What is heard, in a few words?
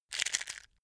matches crunch